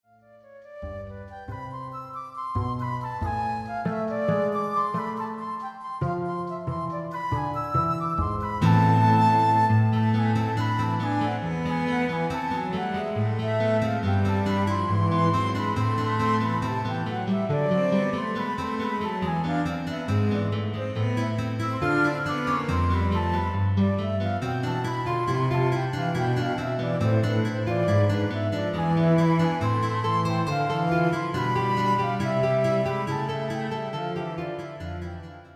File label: Trio